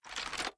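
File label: Inspect Movement; Valorant; judge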